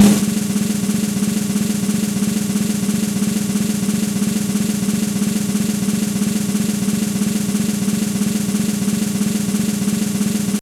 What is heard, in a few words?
Snare Roll, Yamaha DTXpress Acoustic Snare